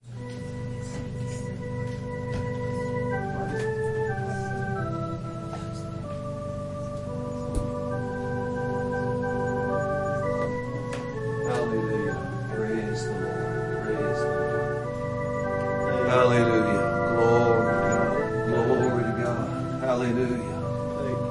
Organ prelude

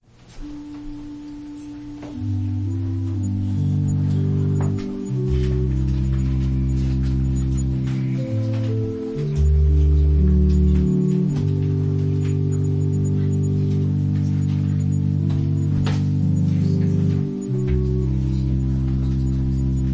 Organ prelude

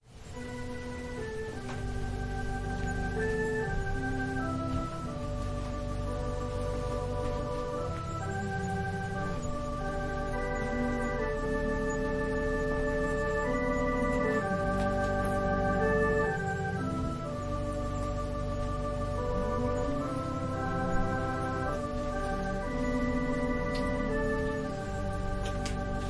Organ prelude

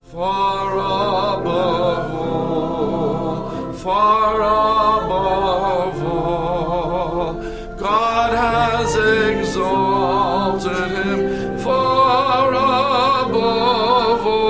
chorus; Far above All

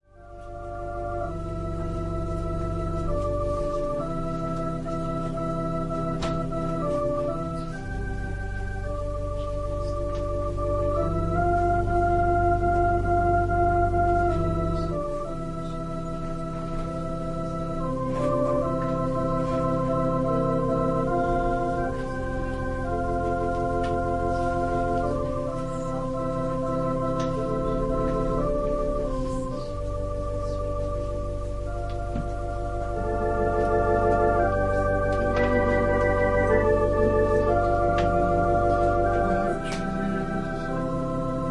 Organ and violin prelude

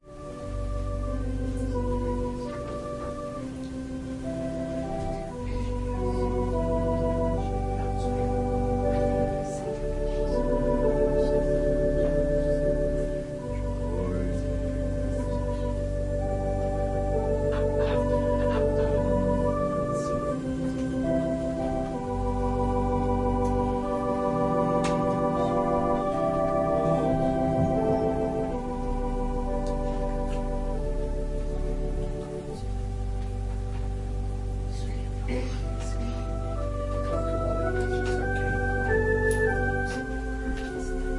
Organ, piano, and violin prelude

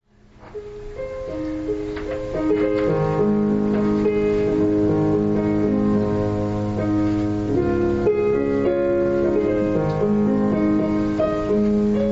Piano prelude

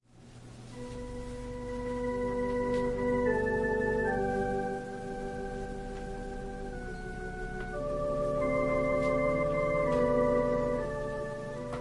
Organ prelude

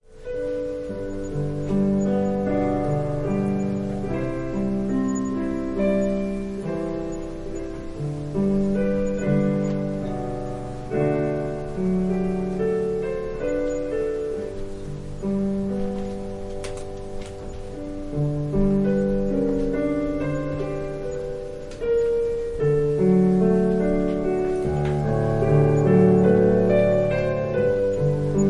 Piano prelude

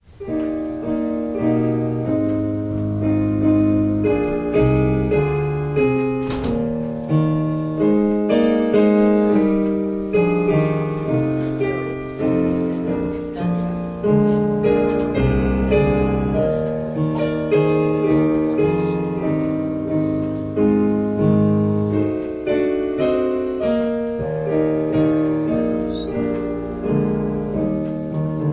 Piano prelude

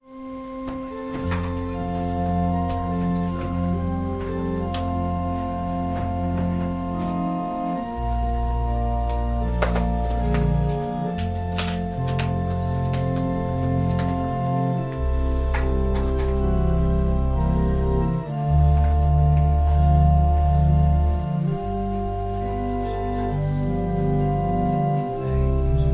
Organ prelude

